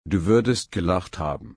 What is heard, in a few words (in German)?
lachen